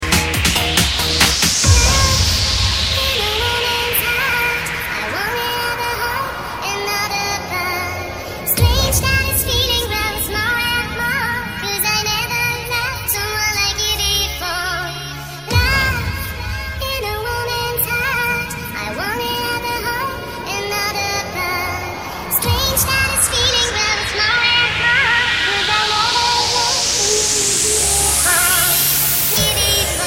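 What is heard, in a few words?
bell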